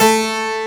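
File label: 8+4 stop A3, harpsichord; AKAI S-Series CD-ROM Sound Library VOL-2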